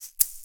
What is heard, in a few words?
egg; 96khz 24b mono; Eggs; instrument de musique